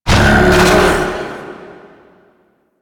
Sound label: Containment Protocol Cast; Warframe; Warframes; Qorvex